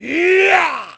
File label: Jump Attack; Ganondorf; Zelda